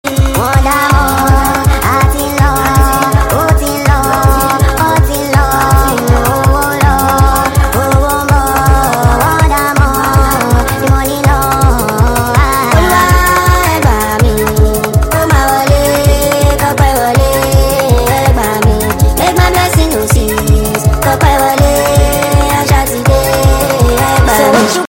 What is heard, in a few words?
Sped Up